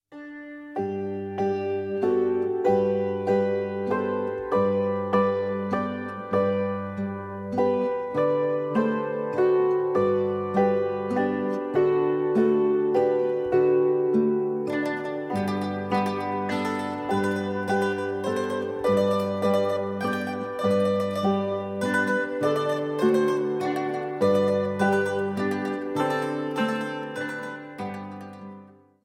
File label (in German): Krippen Menuett Kuckuck